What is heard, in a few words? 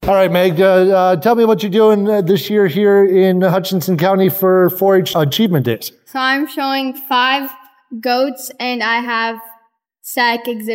vocals